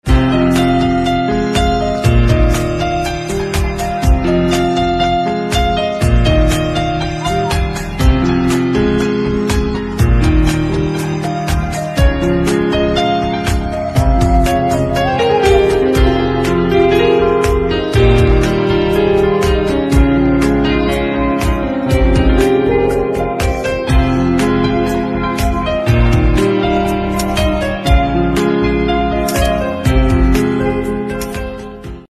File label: Piano Instrumental Ringtone